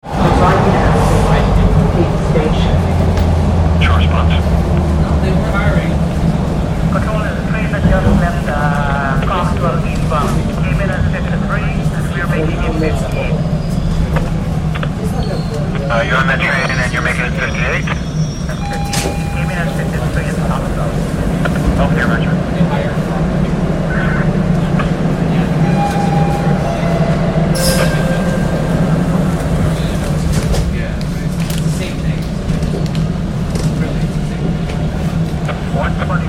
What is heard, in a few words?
train ambiance and scanner audio